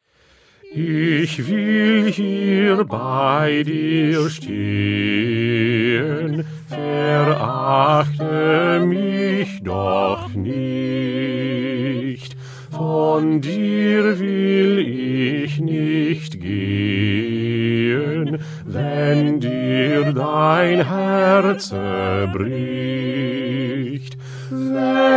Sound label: Bass; Bach; St Matthew Passion